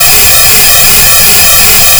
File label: fm cello single; FFTimbre